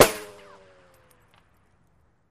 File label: Bullet Ricochet Sin